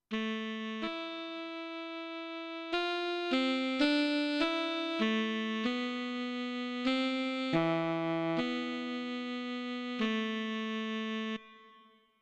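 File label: Alto Sax, digital piano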